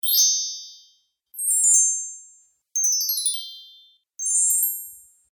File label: Magic Spell Gliss Collection